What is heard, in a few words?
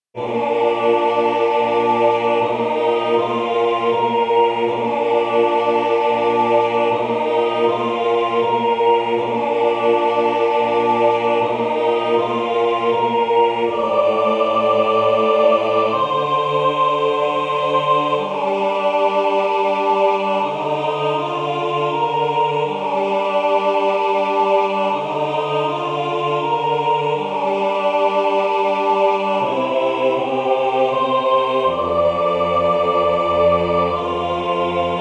bass sopran